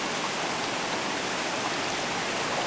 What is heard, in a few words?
Water, ambience